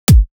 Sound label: Kick; horizon